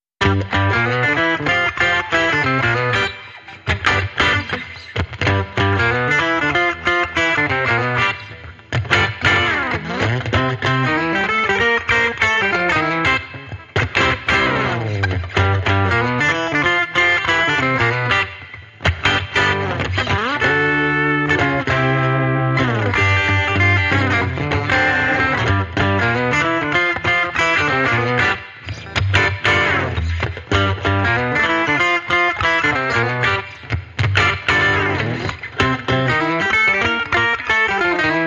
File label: Electric Redneck Guitar Only